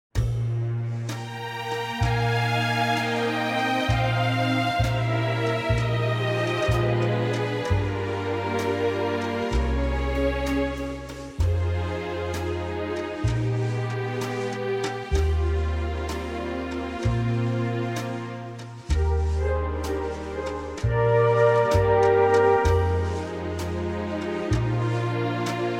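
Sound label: orchestral Eb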